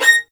B6, LOUD VIOLINS; Q Up Arts; Denny Jaeger Master Violin Library

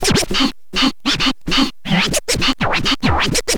scratch short, Vinyl scratches; Masterbits; Vinyl Attack; scratches mix

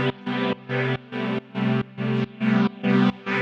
Pad 140 C, sidechained samples 140bpm

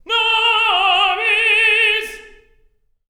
TEN. TRAD; Spectrasonics; Symphony of Voices

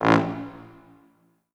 Orchestral Brass

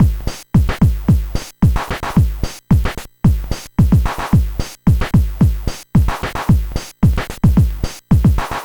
NES Drum Loop; Sonic Foundry (Sony Creative Software)

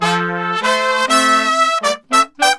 BRASS SAMBA; Best Service ProSamples vol.14; AKAI

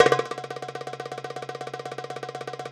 Tabla Roll; Roland BK-5; Percussion

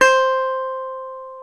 GTR Dobros National; Club-50 - Foundations Roland

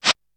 Reverse snare, Drums; ZERO G - Datafile DISC 3

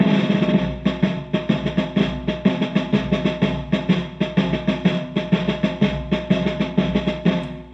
Chamberlin Rhythmate Loop, Drum Machines

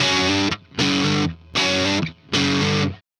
Riff Guitar Ending, Indie Rock; Sonic Foundry (Sony Creative Software); Indie Rock Anthems; Session 06 - Haze

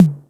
606 TOM; Big Fish Audio; Synth City

